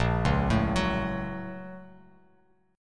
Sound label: Bb Minor Major 7th Sharp 5th, Bass Standard; BbmM7#5; arpeggiated; JGuitar